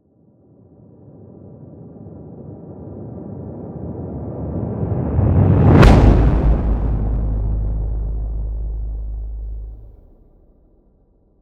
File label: Swell To Impact Distorted; bottlerocket